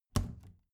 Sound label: plastic trash can close regular muted